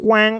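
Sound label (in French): "la", French; Mobiclic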